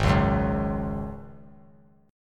G sharp 7th Suspended 4th G sharp; G#7sus4 strummed; JGuitar

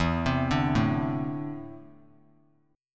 E 7th Suspended 4th Sharp 5th; E7sus4#5 arpeggiated; 16,19,17,19; JGuitar

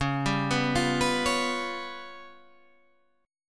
C sharp 7th Flat 5th, Guitar, Standard; arpeggiated; chord; 9,10,9,10,12,9

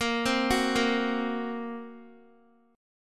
A sharp Suspended 2nd Sharp 5th, Guitar; arpeggiated; x,13,12,11,10,x; 4F,4A,5C,5G,5C,6E; JGuitar

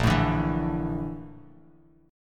Bb Major Sharp 11th, Bass Standard; strummed; JGuitar

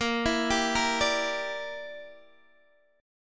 Bb 6th Flat 5th Bb, Guitar DADGAD

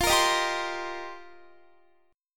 F 7th Suspended 2nd Suspended 4th F Guitar; F7sus2sus4; 4E,4A,5D,5G,5C,6F; strummed

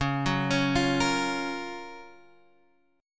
F sharp Major 7th

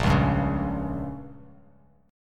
A sharp Minor 6th A sharp down, chord; strummed; JGuitar

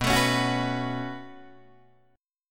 B 7th Suspended 2nd, Guitar, Open D

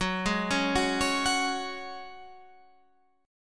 Gb Suspended 2nd; arpeggiated

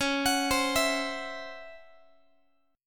C sharp Minor Major 7th Double Flat 5th, Guitar; C#mM7bb5; arpeggiated; x,21,21,22,20,x; 4A,4E,5A,5D,5G#,6C#

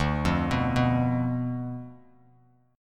D Minor 6th Bass Standard; Dm6 arpeggiated; JGuitar

